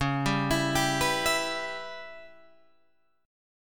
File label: Db Minor 7th Flat 5th Db down Guitar Open G